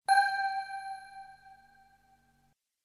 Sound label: Lifeline Ping; Millionaire Soundtrack; US Revival (2020)